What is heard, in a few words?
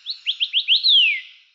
Bird; Lenovo; Z2-Vibe